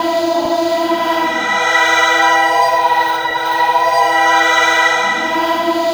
REQUIEM, GOTHIC CHOIR; Spectrasonic Distorted Reality 1

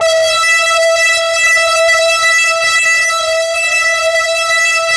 FLYER E4 R, Yamaha VP-1; Music Store; 90's Sample CDs

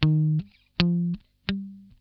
NOTE SCALE, Funk Guitar; 90 s Sample CDs; Zero G

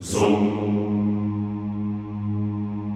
ZOOMMM, Extended Classical Choir; Best Service

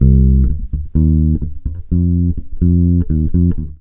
NIGHT 63 C, Bass Grooves; USB Soundscan vol.30; AKAI

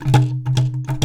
C2 ANKLUNG, JAVA ANKLUNG; WorldSounds

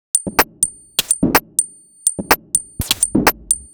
125bpm, House Drumloops

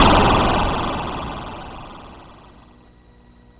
C64 Filter Explosion

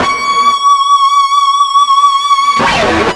FUZZ C#5 R, Guitar; East West; Steve Stevens Guitar Samples Collection

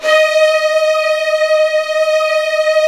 Virtuoso Strings

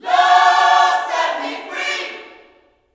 LUV FREE, Vocal Gospel; Vocal Planet Vol1 Gospel

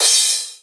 Crash, Drums Cymbal; House; Crashes; Best Service; House Definition VOL-1; Cymbal Stuff

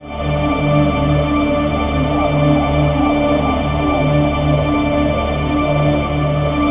BIRD STA, VOX; NorthStar - The Wizard VOL-2

